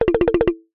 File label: cartoon wobble flutter wood mallet style